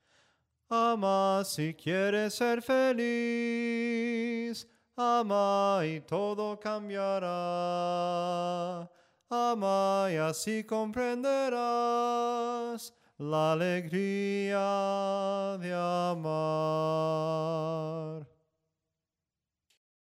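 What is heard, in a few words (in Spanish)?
SOPRANO CANTADO, himnos en melodia soprano; AMA SI QUIERES SER FELIZ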